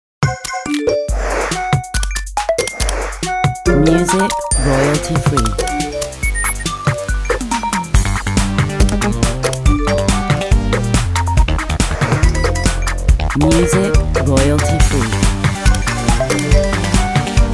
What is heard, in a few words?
Electronic music with Cell Phone sounds